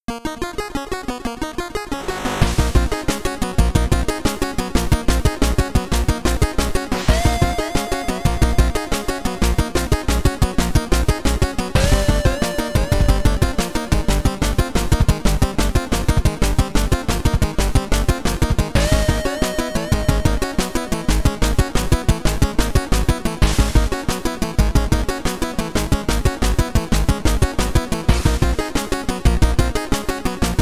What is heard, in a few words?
dark forest boss